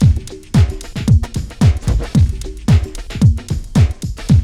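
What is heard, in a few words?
drum loop; X-Static Goldmine 3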